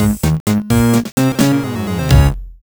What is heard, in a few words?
Area Complete, Jingle; beat city ds gamerip 2010